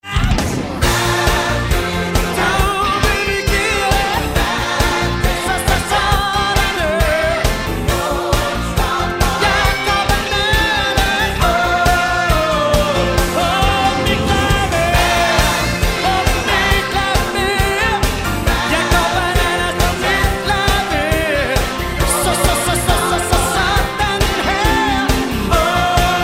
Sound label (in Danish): klaver